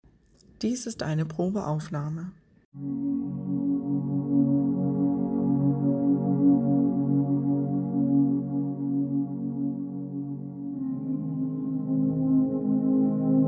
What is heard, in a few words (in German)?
Solfeggio Hoerprobe; Morpheus